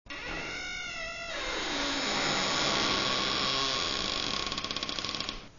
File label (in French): texture grincement